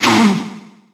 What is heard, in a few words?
Froster pain; Fight Knight